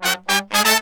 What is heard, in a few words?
BRASS SALSA; Best Service ProSamples vol.14; AKAI